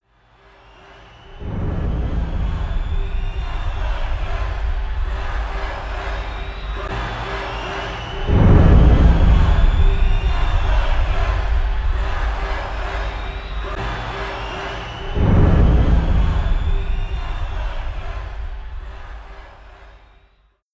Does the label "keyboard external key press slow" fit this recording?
No